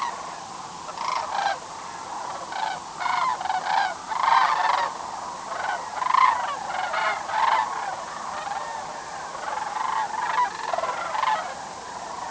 sandhill cranes

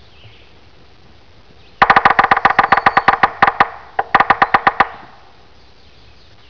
yellow-bellied sapsucker

